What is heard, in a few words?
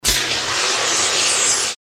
short industrial event